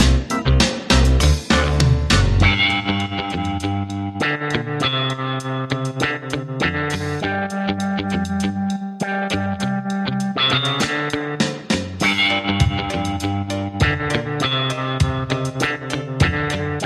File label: Carribean Reggae Music